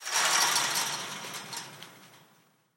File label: ambient chain link rattle, metal